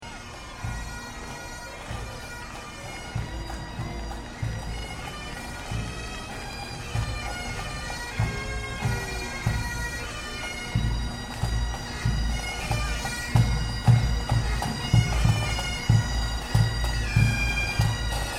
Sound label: Street Parade Scottish Bagpipes Short; Celtic/Scottish parade in U.S. city. Marching drums and bagpipes, some crowd; City Sound Effects; Lynne Music